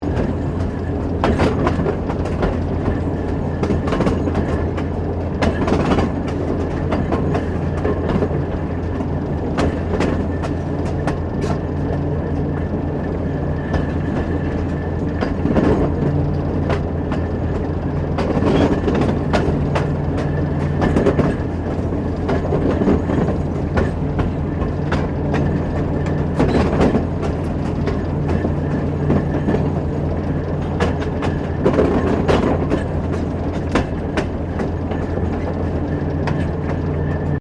Train Diesel TGR X Class 1951 Tasmanian Exterior Operating Loop; Bellarine Tourist railway vehicles; Trains